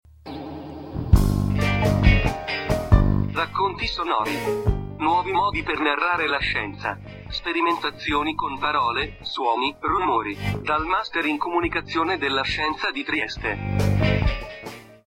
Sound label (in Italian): jingle racconti